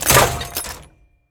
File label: steampunk mechanical metal element heavy; Bluezone Corporation; Video Game Sound Effects